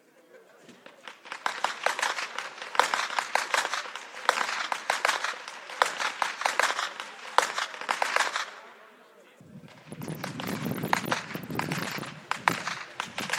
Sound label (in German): schnalzen, jugend; roth; waging